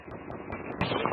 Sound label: sound of cutting grass; minecraft aipha 1 2 6